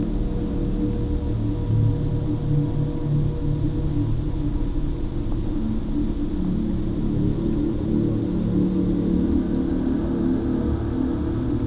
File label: ship battleship idle; Stellaris; toxoids; ships